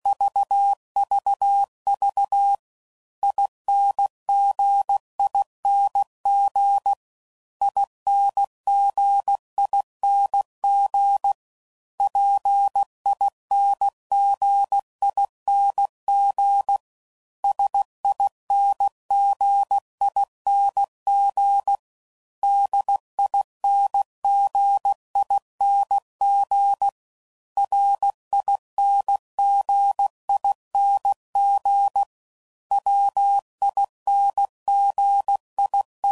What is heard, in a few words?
CW Code Groups 15WPM; HamRadio; Morse Code; Mixed; Suffixes; Special INGs